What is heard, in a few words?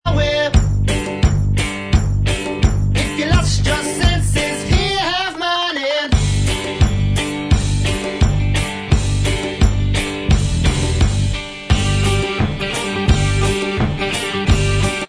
Inst